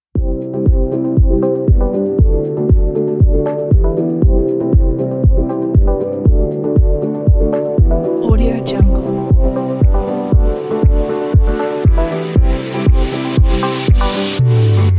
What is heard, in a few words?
summer dance motivation; panda-x-music